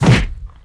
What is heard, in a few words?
Deadly swing; Pc Skill; FlyForInsanity